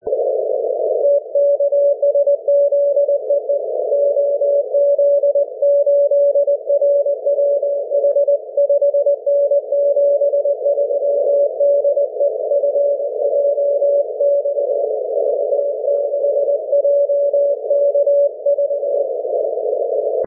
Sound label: 6m cw